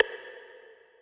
rim verb

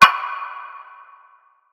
Percs

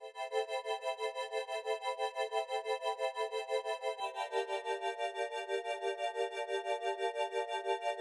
Arp

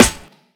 Snares

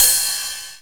Machine Ride, Closed Hats

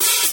Open Hat

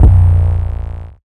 Slide 808

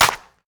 clap

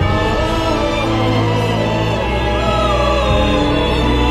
Loop; Sample God

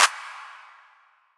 Claps Trap

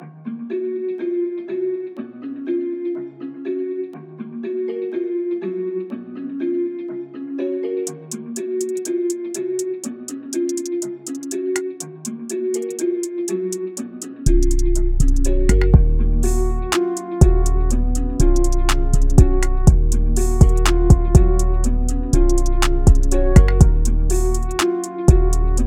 One Shot Kit

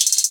hihat roll; Closed Hats

